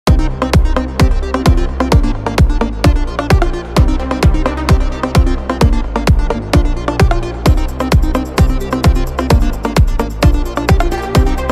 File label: mega party dance hit